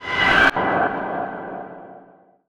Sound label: shadow step; spectre